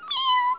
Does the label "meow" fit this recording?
Yes